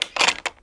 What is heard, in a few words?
open chest